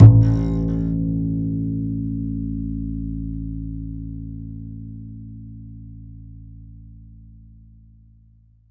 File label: DBASS A; Univers Sons; Jazzistic